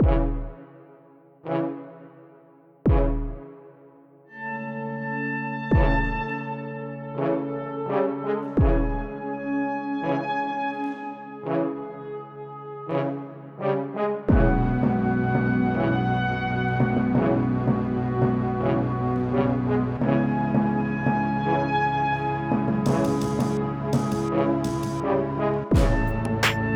Town Music Loop

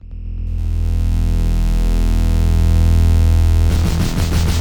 music end intro; Skellboy

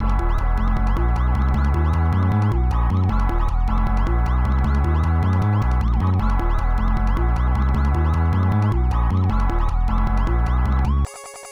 Stage BGM

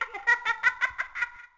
Intro Laugh; the princess and the frog; Restaurant; Laughter Kid

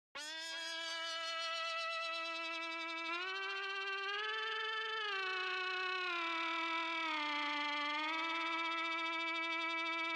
LEAD

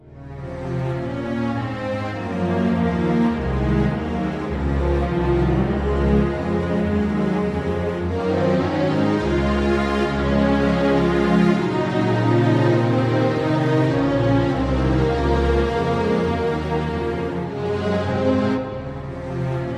Calm

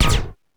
Bounce pad; LittleBigPlanet 2; PS3

